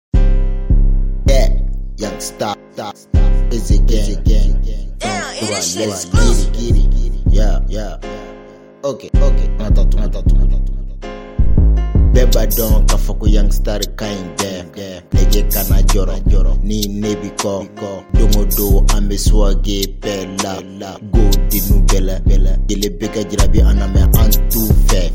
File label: swagg beatbox